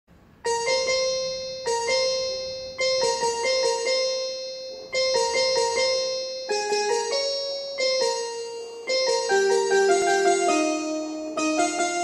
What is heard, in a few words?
ringtone instrumental